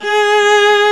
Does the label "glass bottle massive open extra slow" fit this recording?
No